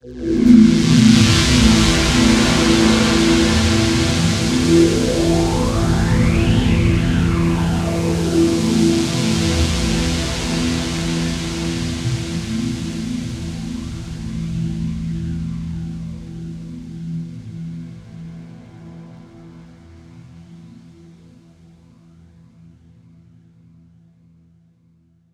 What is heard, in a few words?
Sounds of Space; Julian Ray; Space - The Finest FX